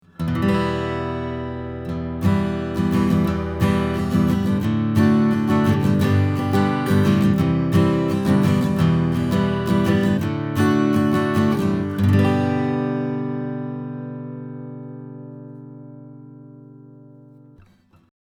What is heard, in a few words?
AKG414XLS Acoustic Git Strum 30cm 15 Fret